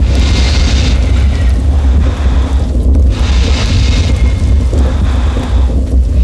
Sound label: massive forge; DAoC